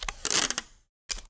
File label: SELTAPE, STATIONERY; AKAI S6000 CD-ROM - Volume 6; Tools